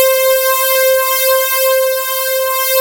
JUP6 BUZZ, Jupiter Synths; E-MU Formula 4000 Series Vol. 3 – Analog Odyssey

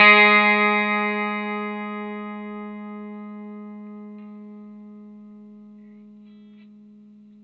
NOTE GS, Vintage Blues Guitar; USB Soundscan vol.22

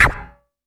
Yamaha CS1x Drums

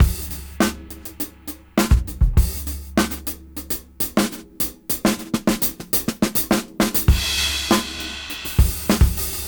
Tricky 101 BPM; AMG - Keith LeBlanc Phat Butt Jams

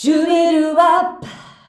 SHUBIWAP F, FEMALES

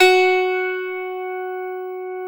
lion harp F# 1; SoundBytes; Early Patches

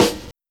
Snare OS; Rondo Brothers Trip-Hop Loops & Samples; Sing Into The Machine

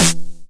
909 SD Tape Sat

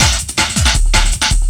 LOOP, 160 BPM, Drum & Bass

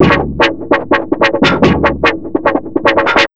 AMBIENT 147 WAH, Big Beat Drumloops

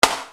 Snare Dr

